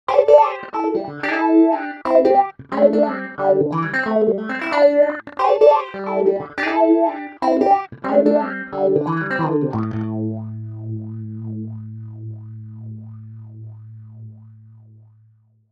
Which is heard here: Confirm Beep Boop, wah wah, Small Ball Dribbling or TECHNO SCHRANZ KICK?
wah wah